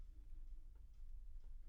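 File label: Contrabass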